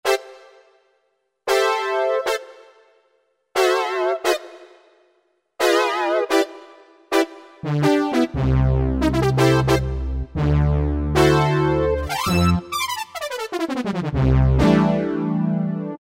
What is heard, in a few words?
Brass, Yamaha A4000; Syntraxx - Loops